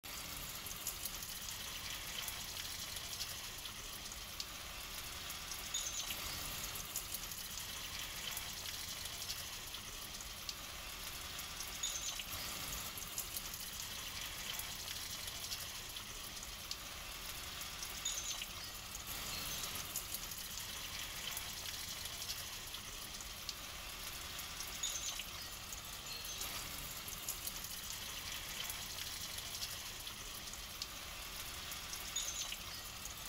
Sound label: Watering plants